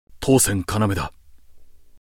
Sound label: Message; Kaname